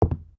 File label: step wood; STALZONE; minecraft